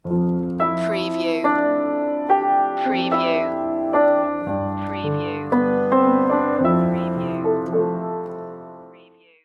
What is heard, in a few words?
right here with you stinger